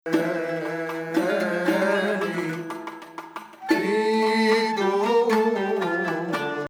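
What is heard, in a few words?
Hijazkar Nahawand, Arabic music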